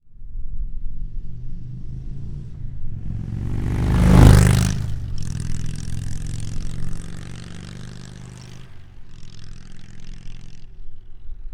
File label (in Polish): indian scout dzwiek ukladu wydechowego slip on w czasie przejazdu; Rinehart racing